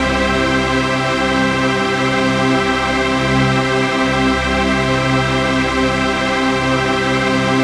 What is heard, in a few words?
RAVE PAD; Best Service; XX Large; Pads